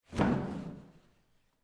Toilet Paper Roll, Office, Toilet, Paper, Roll, Rip, Sheet, Public, Bathroom; Public Bathrooms